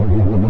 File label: phasemissile; Tanks